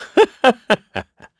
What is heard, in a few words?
Vox Laugh; KaselB; kingsraid